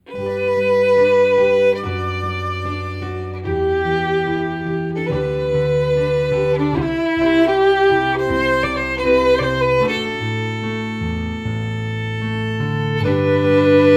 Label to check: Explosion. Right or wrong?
Wrong